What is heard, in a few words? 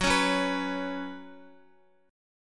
Gb Suspended 4th Gb, down, Guitar; strummed; JGuitar